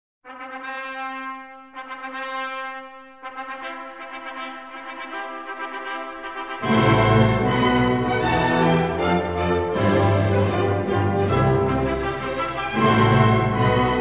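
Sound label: sounds of fanfare and a solemn awards ceremony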